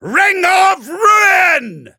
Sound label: battle ring; dqxi